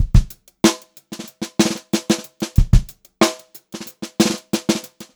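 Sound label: groovy variation, Funk-Groove Drumloops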